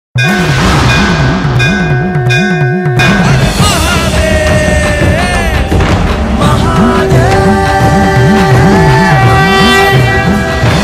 Har Har Mahadev Mahaadev with bold music short ringtone